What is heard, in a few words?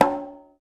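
Conga Open; Roland BK-5; Percussion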